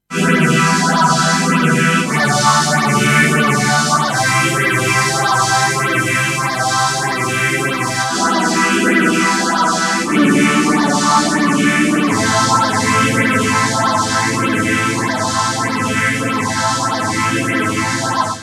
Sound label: Vintage String Ensemble Moogerfooger 12 Stage Phaser